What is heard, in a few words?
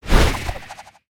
Spinjitsu Ice Shuriken Project